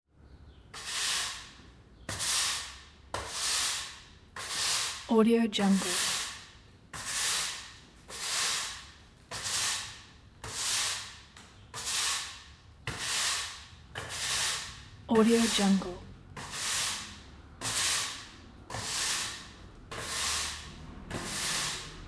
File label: sweeping with broom in garage